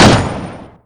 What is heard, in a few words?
lmg loop, 7.92x57mm; Caliber